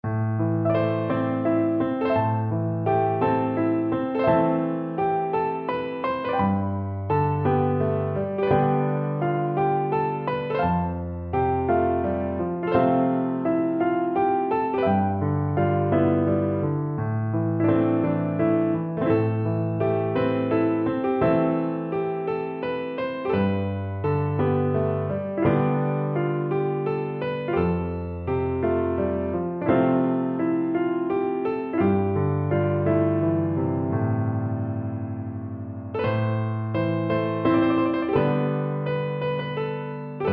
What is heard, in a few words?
Piano